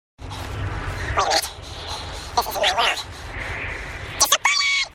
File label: disappointed